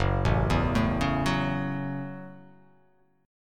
Gb Minor 7th, Gbm7 chord; arpeggiated; JGuitar

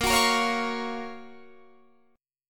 F sharp Major 7th

